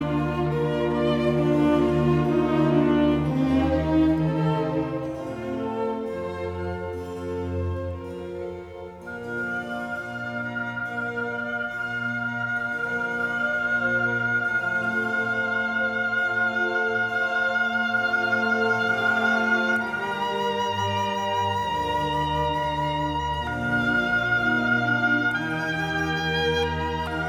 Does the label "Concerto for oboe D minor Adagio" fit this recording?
Yes